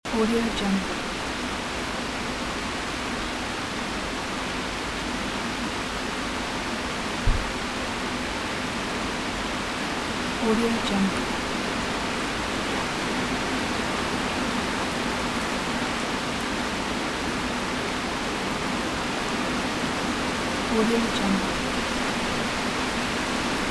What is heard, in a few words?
intensive rainfall on village yard loop; بارش باران شدید در محو; nature sounds